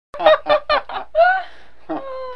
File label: lafter; Sound Effects Library 1.5